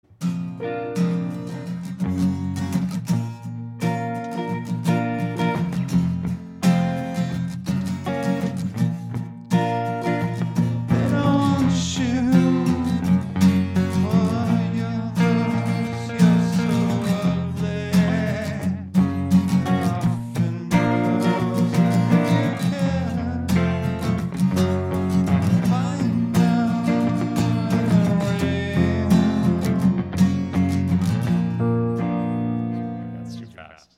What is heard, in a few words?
acoustic